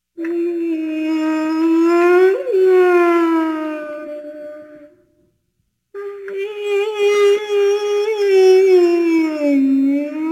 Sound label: Ghost Sounds; Halloween Havoc - Sound Effects; Ktel